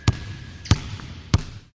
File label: Ball bouncing indoor basketball court